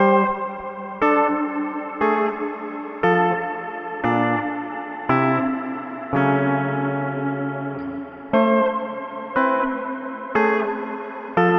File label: Mooer R7 X2 Mod